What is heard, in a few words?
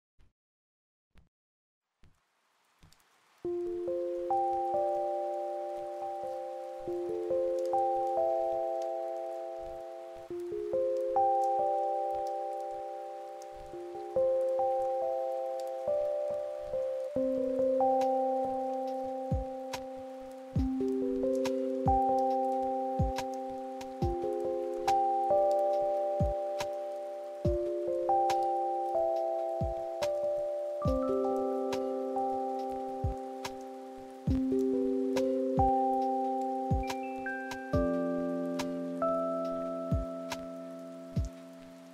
soft sensual sleep soundtrack